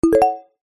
multimedia game sound tone wooden mallet generic

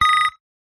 ui computer complex beep

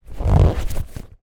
sport afl ball handling close up; zapsplat

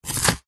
food capsicum pepper chop with knife

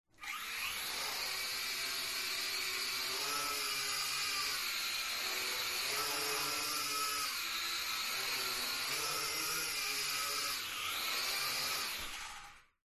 Drone Falcon; fox audio

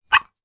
household metal dessert bowl take out of other

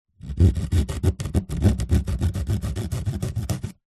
foley cardboard cutting with serrated knife

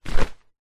sport wetsuit drop ground; zapsplat

